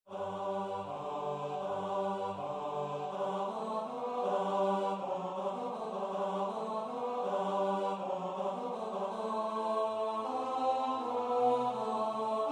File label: tenor; korsats